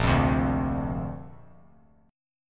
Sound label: Bb Major Add 9th, Bb, down; strummed; JGuitar